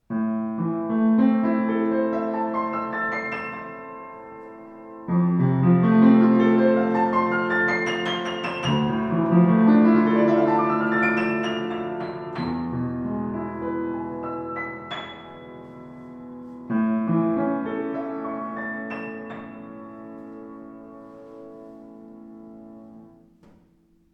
Sauter Kreuz, Klavier; 118 sauter r2 rr schwarz

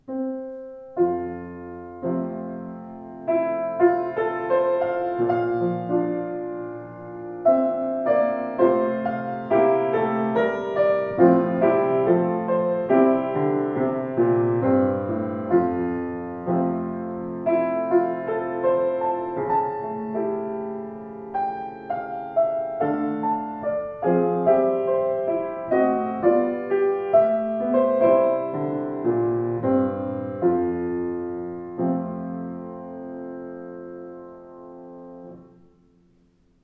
Foerster, klavier; 110 august foerster schwarz

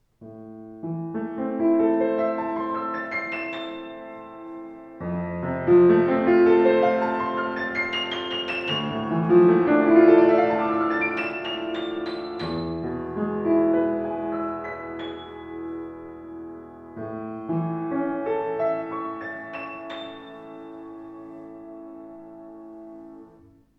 Schimmel, klavier; 112 schimmel nussbaum

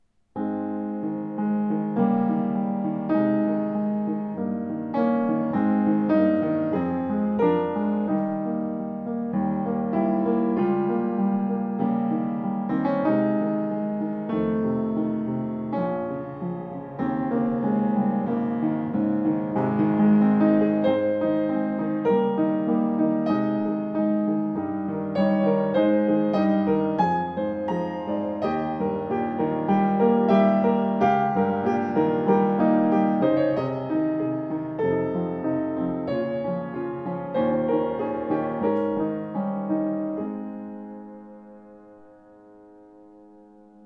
G124 Beeth, Grotrian-Steinweg Klavier

